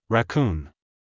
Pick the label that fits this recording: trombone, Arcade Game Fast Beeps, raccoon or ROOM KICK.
raccoon